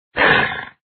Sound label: zombie angry